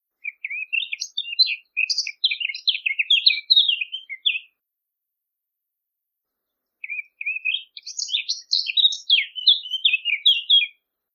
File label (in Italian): CAPINERA